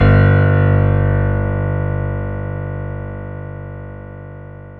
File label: PIA MICRST, MICRO-PIANO, Roland; Sound & Vision - Gigapack II